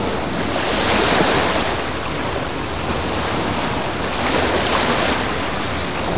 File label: sea station; spawngirlsounds